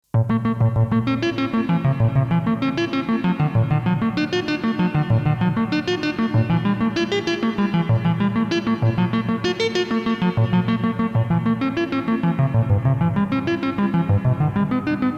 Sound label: mininova sound example